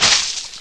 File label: web Fire, combat; blobAndConquer